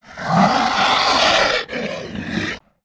Pets Tortoise Rage; creatures; Lego

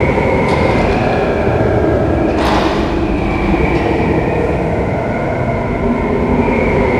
crevice wind; ninjago

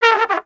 Pets Skunk Stink Horn; creatures; Lego

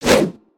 Staff Spinjitzu; weapons; aronwk collection; ndaudio

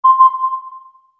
GF Ninja Detector Sonar; gnarled forest

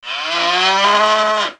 Pet Buffalo Happy; creatures pets; Lego

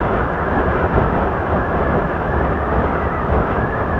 aura light breeze, ambience

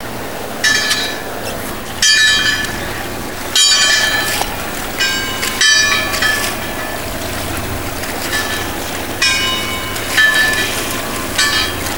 Buoy; ambience; pet rock

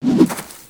landing whoosh; cinematics; minifig

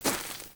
Footstep; Lego; Strombie; creatures organic

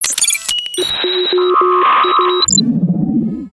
Hologram Zoom; ambience; Paradox; nexus tower

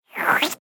Frog Hat Slurp; creatures organic; Ribbit Frog

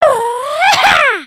air guitar

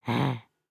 Idle Bored, creatures organic; Lego; Strombie

